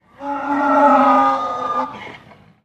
Donkey Snort Single

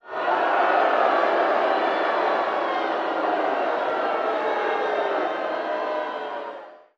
Crowd Large Crowd Boo

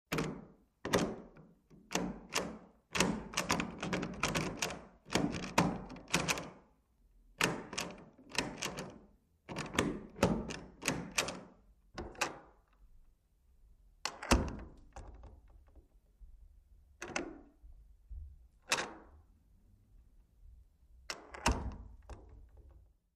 Door Handle Turn

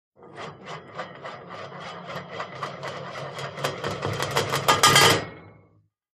Auto Hubcap Spins

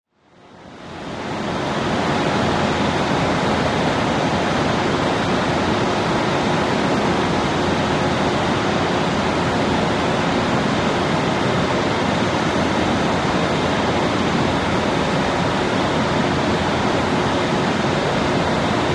Dam Interior Ambience With Huge Water Pump Sound

